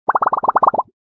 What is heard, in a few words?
Blow Bubble, notifications; Itel it1406